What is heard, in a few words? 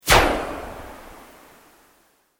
Sweep Organic Fast